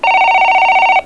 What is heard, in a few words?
FAX MODEM